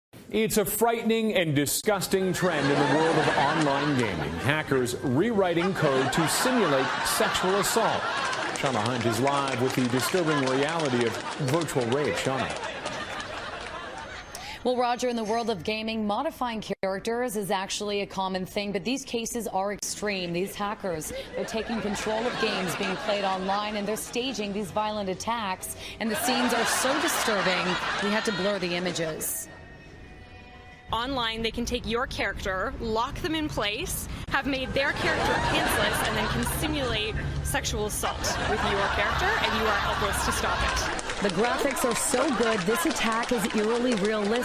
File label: Laugh Track